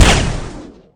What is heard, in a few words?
pulse sniper, weapons; garrysmod